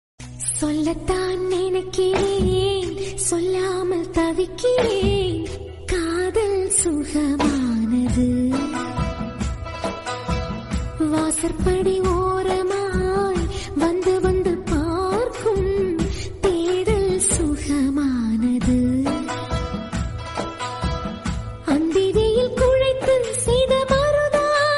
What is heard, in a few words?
Ringtone, Tamil; Beautiful Tamil melody